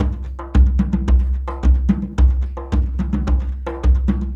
Djembe; Ambient Grooves; World Groove

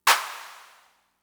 Clap; Fricke MFB-522

